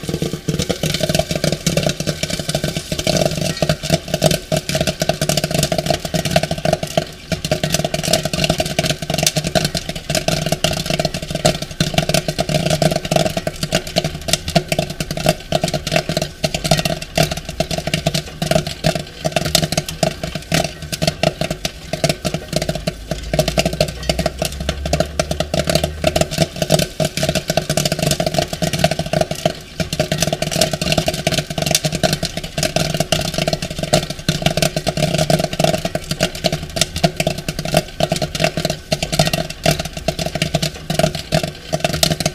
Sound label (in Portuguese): barulho de pipoca explodindo; toques para celular